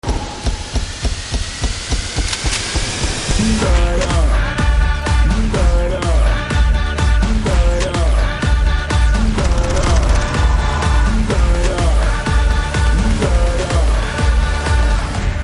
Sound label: Trailer Theme